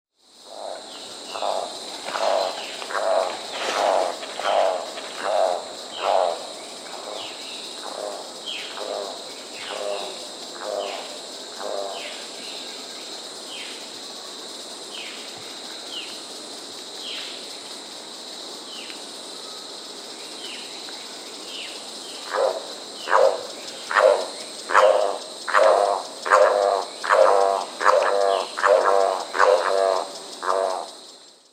Golden-collared Toucanet (Selenidera reinwardtii), Peru; S. America